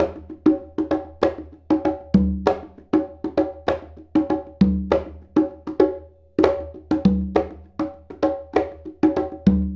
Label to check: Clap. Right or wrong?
Wrong